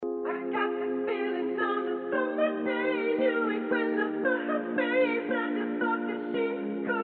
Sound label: B Vox